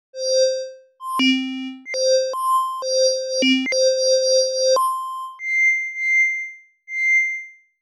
bass patch synth 160

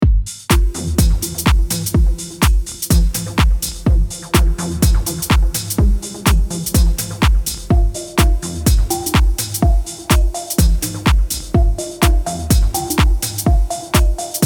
technomatic; mixdown

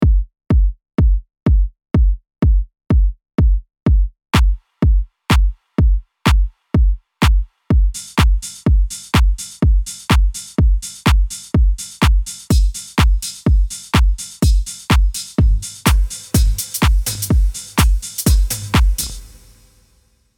technomatic drums

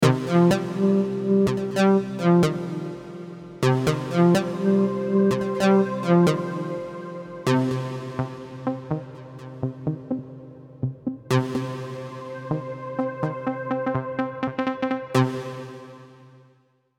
hypnotise synths

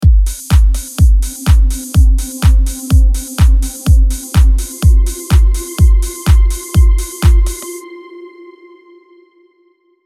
bass mixdown

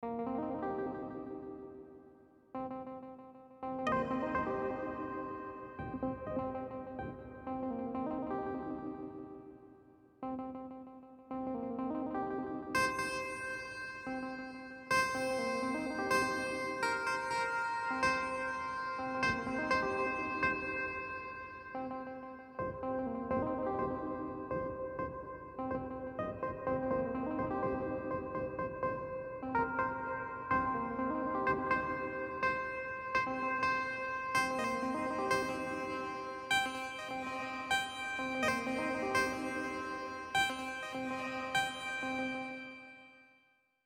this is house synths

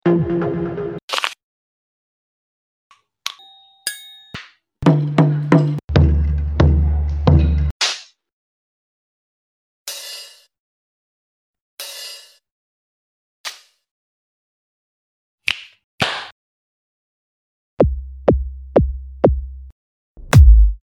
bass kit drums 160

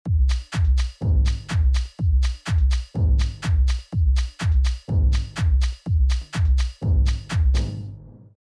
traction drums

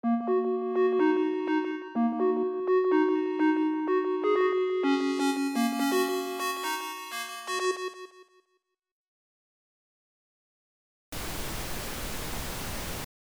sonar synths

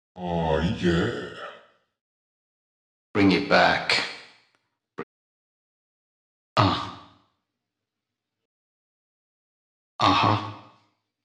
sonar vocals

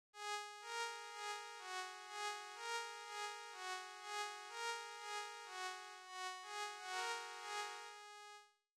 traction synths; BPM 124

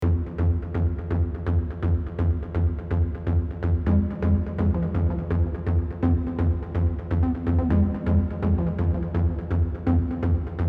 deeper synths; Deep House; Artist Dappledark Studios; BPM 125

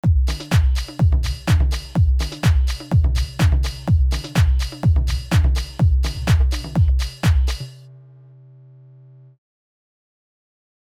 sonar drums

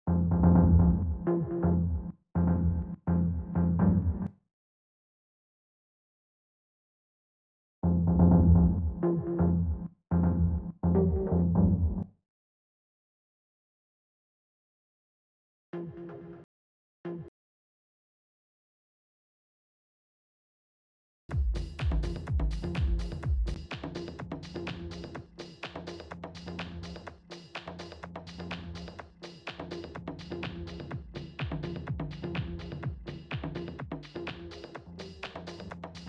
Freedom Synths; Tech House; Artist Dappledark Studios; BPM 125; Grade Lite

